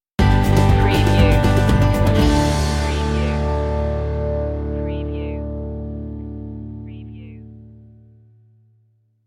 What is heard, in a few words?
transparent stinger